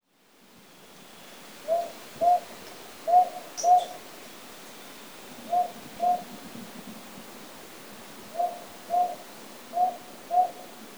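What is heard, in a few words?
Northern Boobook; aobazuku; koe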